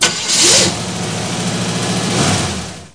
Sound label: GO CAR; jalopy